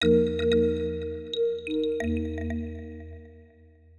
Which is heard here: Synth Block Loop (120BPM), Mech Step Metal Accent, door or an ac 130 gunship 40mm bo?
Synth Block Loop (120BPM)